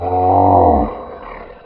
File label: gulp